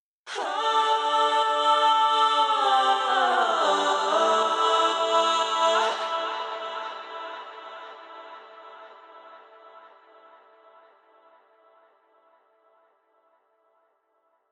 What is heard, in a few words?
120BPM A AIRY VOICE